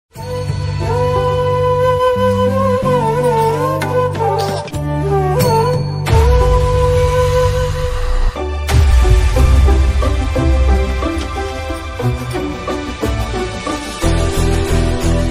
tamil love bgm ringtone telugu bgm love bgm ringtone